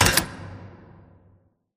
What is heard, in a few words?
Giant Pillar Pods Start; Titanfall 2; Boomtown